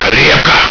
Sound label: tight